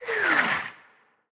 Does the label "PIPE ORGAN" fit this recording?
No